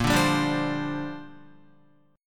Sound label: Bb 6th Add 9th Bb Guitar; Bb6add9 strummed